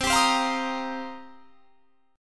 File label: C Minor 11th C down; Cm11 strummed